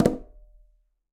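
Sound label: Conga B Flam Mute, Latin Percussion; Drums; Drum Hits; Ableton; Factory Packs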